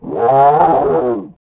Call, Dino; Pachy

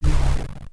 zap

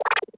hit chirp, droids robots

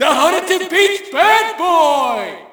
Shout, audience; UFC Tapout 2

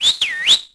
whistle

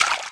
fountain splash; Tony Hawk's Project 8; XBOX; houses

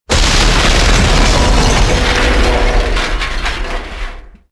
container truck crash; docks; Max Payne

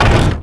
Bonus Latch; Finding Nemo; XBOX

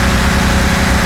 HIGH, Engine; Cars; XBOX; MATER

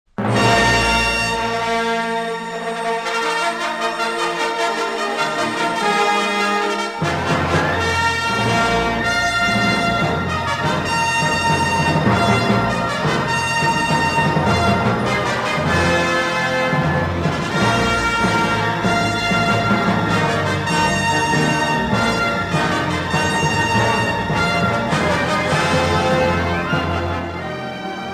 cinematic, music; Star Wars Jedi Knight II Jedi Outcast; XBOX

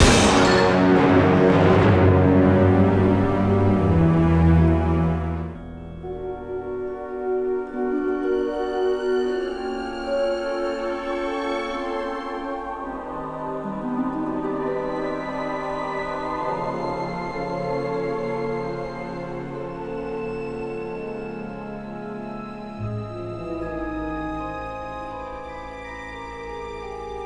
music flourish

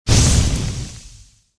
fire out

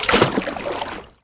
Med Splash, Dino

